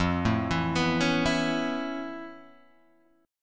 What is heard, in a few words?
A Major Add 9th F Guitar Standard, chord; Aadd9/F Chord {1 0 2 4 2 0} arpeggiated